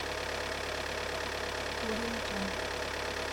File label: large diesel car engine idle loop